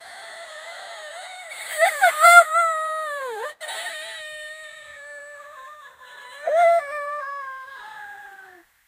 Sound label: crying, grief